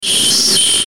High Frequency Distortion Scrape Ascend Descend, High Frequency Filter Metallic Scrape; Production Element Imaging Element Accent Transition